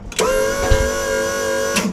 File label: small servo; E-MU Producer Series Vol. 3 – Hollywood Sound Effects; Science Fiction